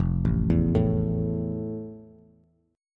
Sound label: F Major 7th F, Bass; arpeggiated; JGuitar